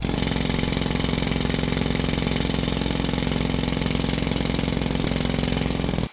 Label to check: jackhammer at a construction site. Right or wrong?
Right